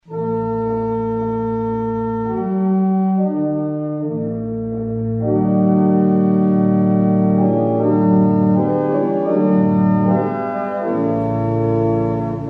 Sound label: Chant, barrel organ; Barnston